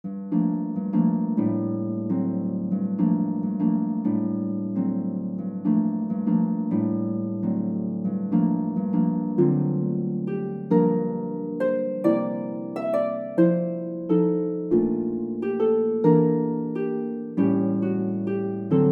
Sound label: What Child is This midi sample